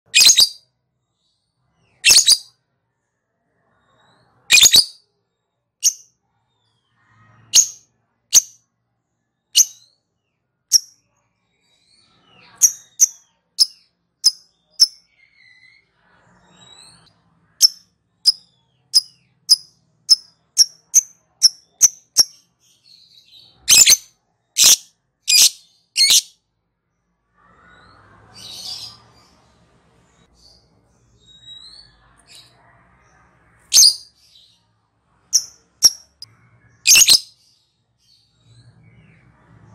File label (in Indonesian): suara burung lovebird ngekek